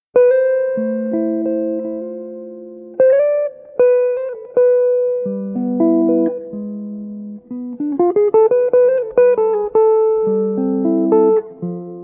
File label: Tranquil Fjord Solo